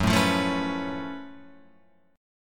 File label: F sharp Minor Major 11th, F sharp, Guitar, Open F; strummed; 6,4,5,4,4,6